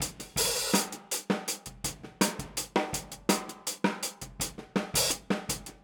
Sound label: Boogaloo, Hi-Hat, 82 BPM; Drums; Verse; Sonic Foundry; Drums from the Big Room The Mixes